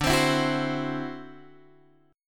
D 9th D down Guitar Open G

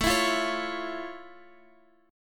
F Augmented Major 7th, chord

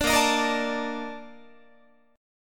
Bb Major 11th Bb Guitar; strummed

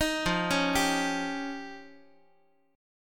F 7th Suspended 2nd Sharp 5th, Guitar; F7sus2#5 arpeggiated; x,x,6,3,5,6

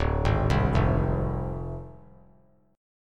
D Minor 7th Flat 5th; Dm7b5; arpeggiated

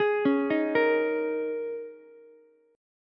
G sharp Suspended 2nd Suspended 4th, Ukulele; arpeggiated; 13,13,11,13

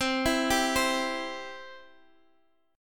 C Major, Guitar Open G

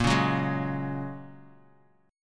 Bb Minor 7th Sharp 5th, Bass Standard; strummed; JGuitar

